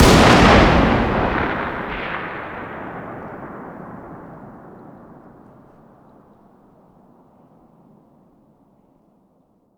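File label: fire distant 338lm rifle exterior; gmod; arccw uc commons attachments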